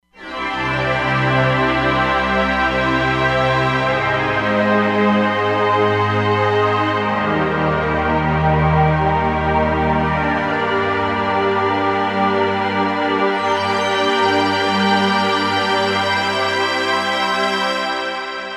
Vulcano Pad, Roland Fantom XR

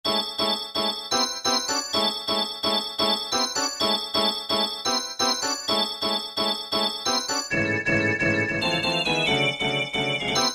Fly Chord, Roland SR-JV80-06 Dance

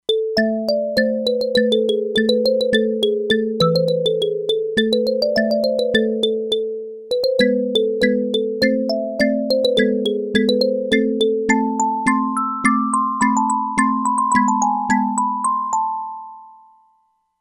Marimbala, E-mu Proteus-2; Factory Presets

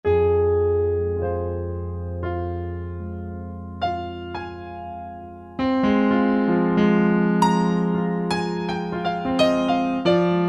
GRND A, Piano, Yamaha A4000; Piano - Keyboards

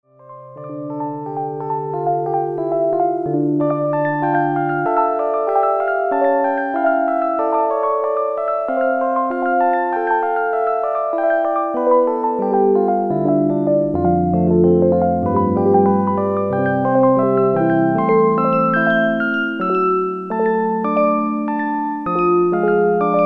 ECHO PIANO, Roland Jupiter-8; Factory patches

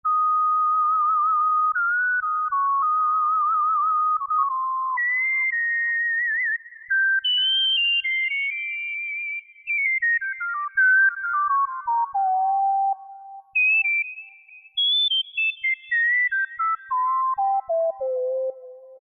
Lip, Yamaha TG500

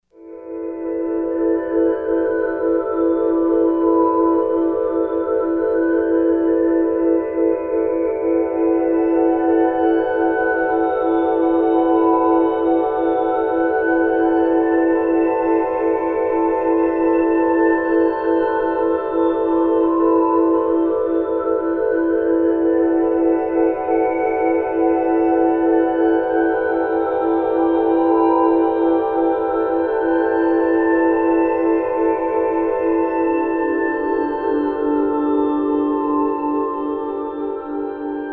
Melancholy, Novation XioSynth 25

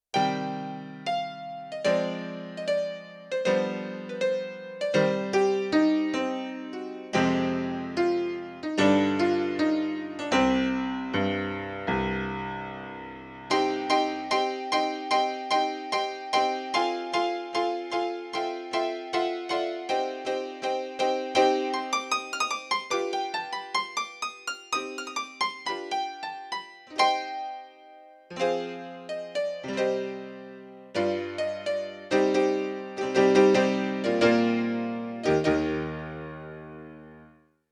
Classical Grand, Yamaha CFIII Grand Piano; Yamaha TX16W

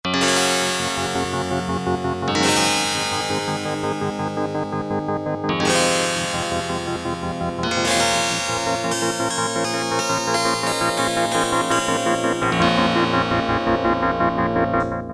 HARPSI-STG, Yamaha DX7

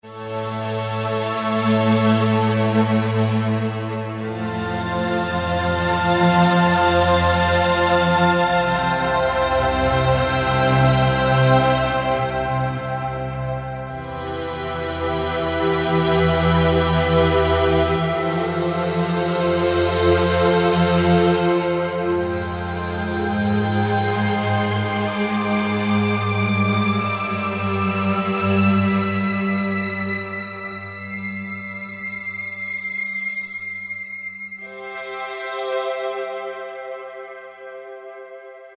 Glass Voices, Roland D-50; Factory preset demos

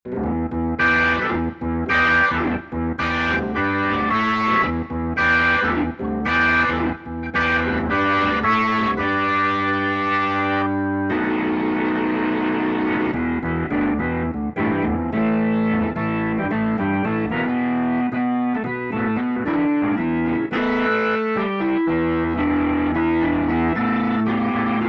OD-ROT ORGAN, Roland SC-880; Factory Patches

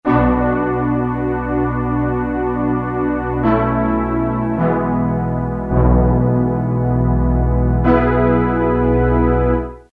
Analog Pad; Roland M-DC1

